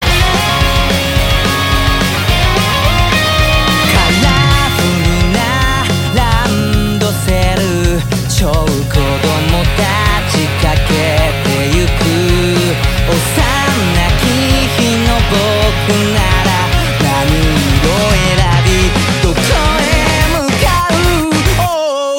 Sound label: Ending Credits Piano